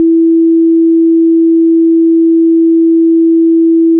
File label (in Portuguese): nota MI 329.6Hz; Instrumentos Musicais